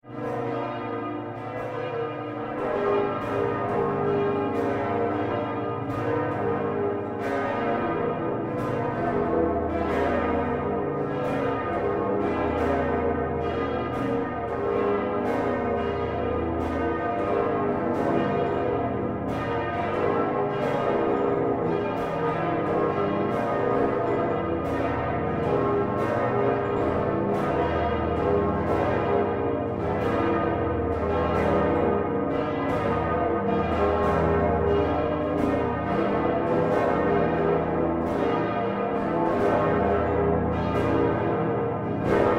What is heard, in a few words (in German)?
Handyklingelton Glocken, Kreuzkirche